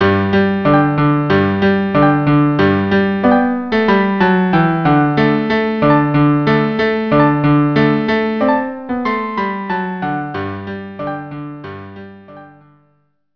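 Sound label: cello piano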